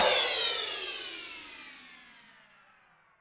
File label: crash one shot b key; cymbal drum samples